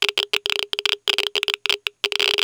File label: geiger; Soldier Of Fortune II Double Helix; XBOX; ambience